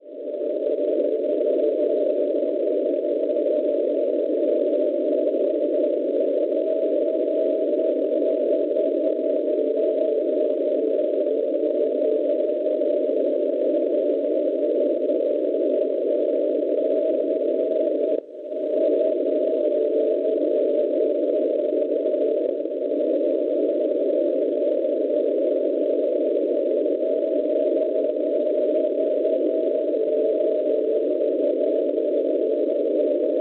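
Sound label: non-directional beacon 347 kHz; CVT; Chalons; Francia; Schwarzsee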